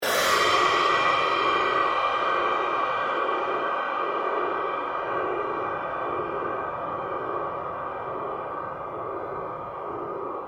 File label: pulse fade long, Various sweeps, pulses and drones; Rise And Dive; Rises and Dives